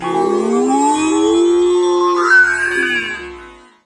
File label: uuuuuuuuuuuuuuuiiii